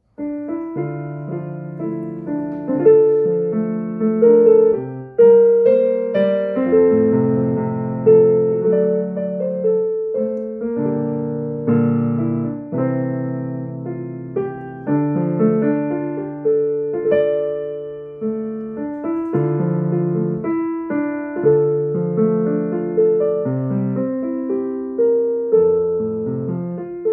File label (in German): klavier; chua nhat 3 mua vong